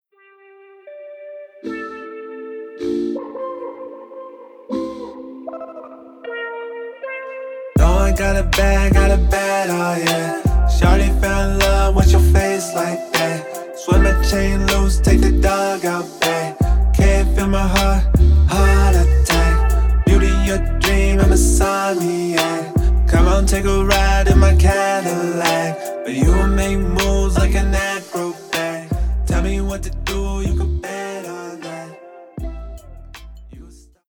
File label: HIP HOP